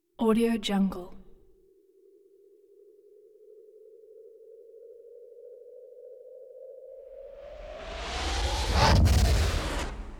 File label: power beam shock wave high end sci fi woosh